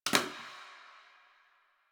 Janitor Plastic Spray Bottle; Warframe; Ambience; Nineteen Ninety Nine

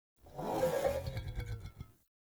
Wolf Growl Relaxed Jog; Animals

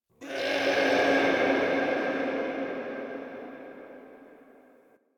interior texture aggression calls; Ambience; Misc Indoor; DE; Warframe; Infested Eris

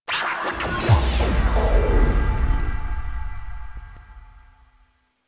Music Game Over; Warframe; minigames; Caliber Chicks minigame

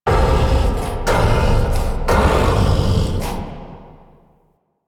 Start Wave; Warframe; Entrati; Mirror Defense

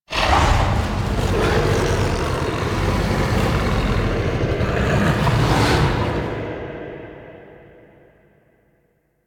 Idle Noble Bow; Warframe; Voruna; Idles Noble

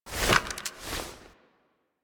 Equip; The New War; Lua Valley Ext; Burston

